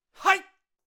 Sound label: Taekwondo Attack Vocals